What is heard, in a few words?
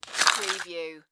Chalk Box Slide; Foley